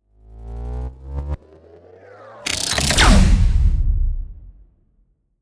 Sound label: promo pad